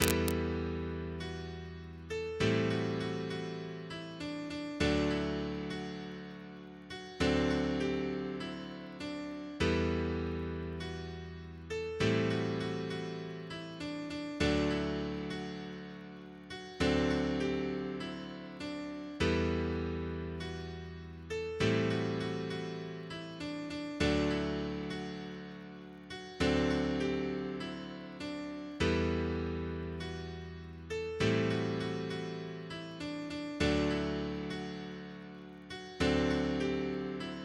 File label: Eyes blue piano